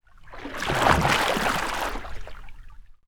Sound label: Water; TOOLKIT vol.2